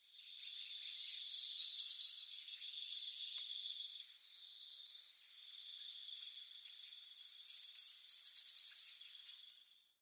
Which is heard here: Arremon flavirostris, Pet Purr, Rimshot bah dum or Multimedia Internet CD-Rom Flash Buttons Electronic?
Arremon flavirostris